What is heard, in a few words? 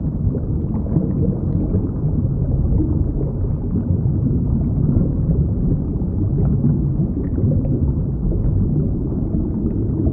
Underwater; Ultimate Fishing Simulator 3 Demo